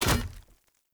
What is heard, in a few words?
Short Sword Medium Impact Wood Hollow Large; Player; Environment